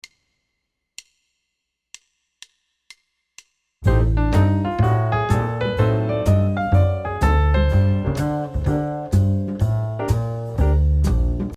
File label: dim pattern3